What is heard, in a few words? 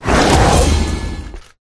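Rat attack up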